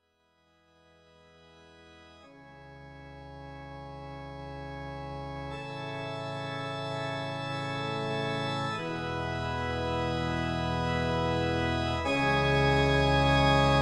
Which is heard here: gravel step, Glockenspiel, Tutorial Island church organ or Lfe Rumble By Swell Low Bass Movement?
Tutorial Island church organ